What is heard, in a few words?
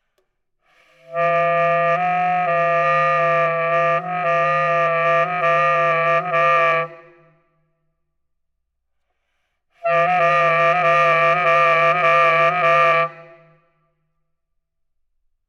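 Multi trills Baritone Sax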